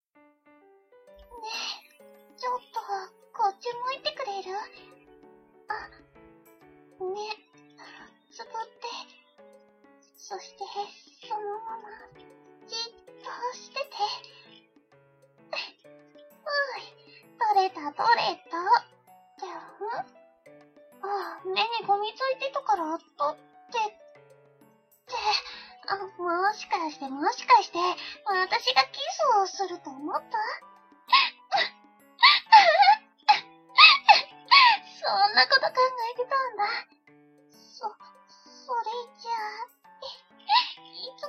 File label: kiss